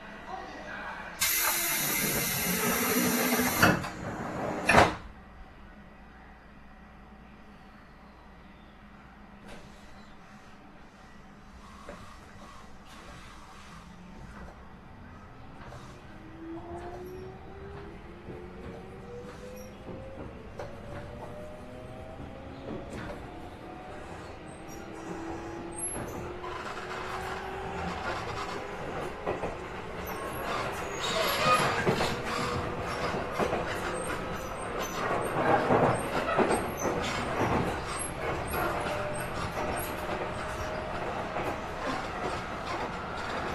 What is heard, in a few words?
yokosuka sobu rapid 253, train